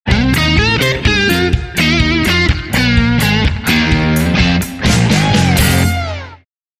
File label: Railroad Funk, Sting